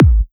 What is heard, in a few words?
BD Punchy; Vengeance Samples; Bassdrums